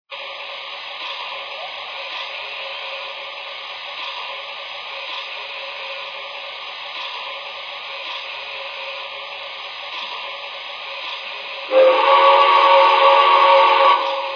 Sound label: j1e, trains; 606; BNA